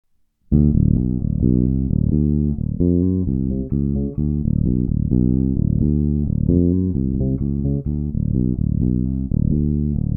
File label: Dropped